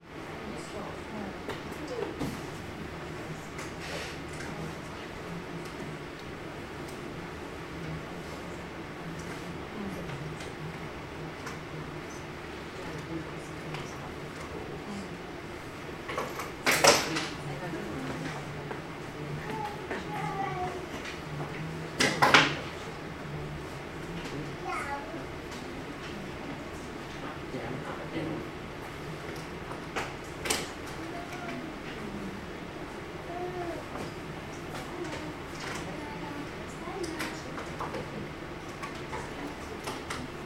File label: ambience hospital waiting room childrens dept uk people chat children play quiet